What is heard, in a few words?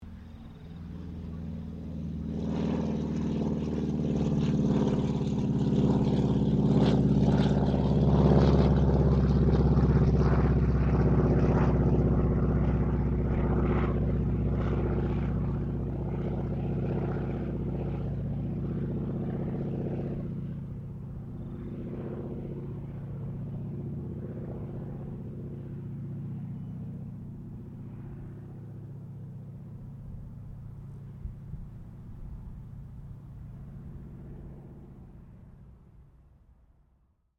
AIRCRAFT T-28D TROJAN PASS; Airplanes (Sound Effects:Vehicles)